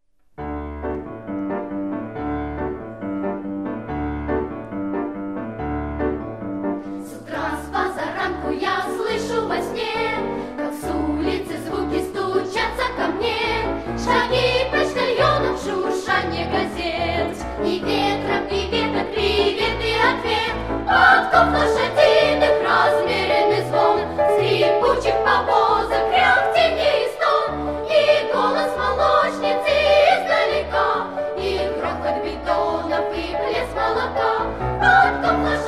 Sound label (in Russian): khor golos det stva utrenniye zvuki